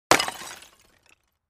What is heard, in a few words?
Clay Chimney Wall Hit, concrete & brick